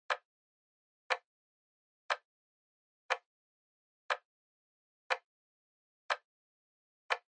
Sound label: Clock Second Hand Dry Loop